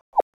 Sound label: On delete item